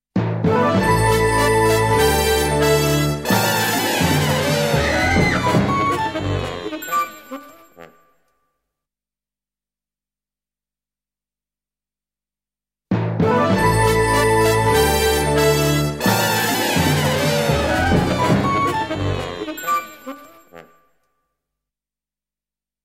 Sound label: banana fanfare; AV Music Waltham; Jingle Tracks; DennisMusicLibrary